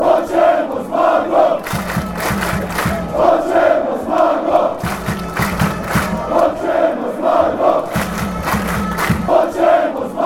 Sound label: slovenia chant